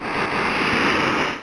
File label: Koopaling Cake Slide; New Super Mario Bros. Wii